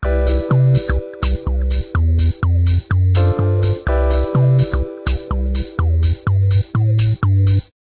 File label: ringer; Ringtones